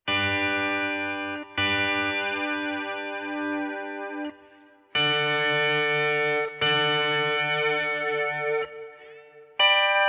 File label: Chorus, AXE FX 3